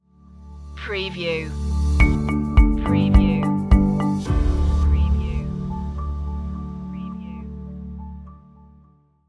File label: bike ride stinger